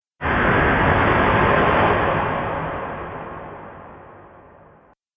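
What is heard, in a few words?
Science fiction Horror Impact; Sf Horror Impact; Miscellaneous Impact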